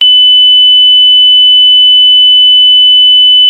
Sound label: long beep; active911